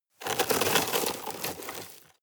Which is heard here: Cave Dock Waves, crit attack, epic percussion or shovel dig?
shovel dig